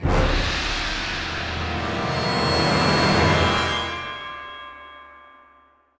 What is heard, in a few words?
Boss Hell King Step 3 Intro; Dungeon Hunter 2